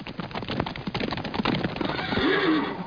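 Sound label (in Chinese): ma jiaosheng, horse neighing; jiachujiaosheng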